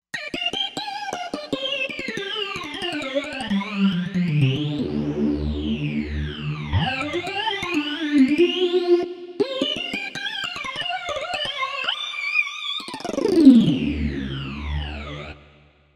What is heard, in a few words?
Epic Lead